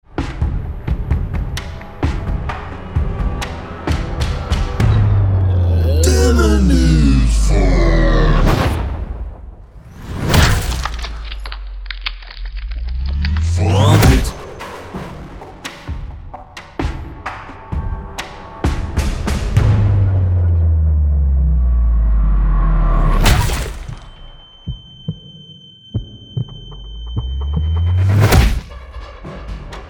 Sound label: tension fight